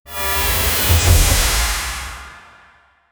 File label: cyber glitch whoosh synt; synthwave